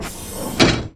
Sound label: dropoff airlock shut, mono; dokobots